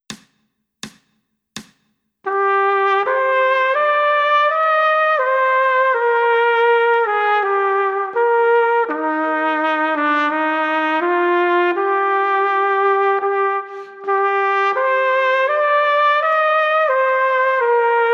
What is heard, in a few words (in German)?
Ich steh an Deiner Krippe hier 1. Stimme